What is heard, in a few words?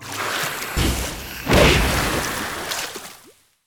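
snake boss attack, monster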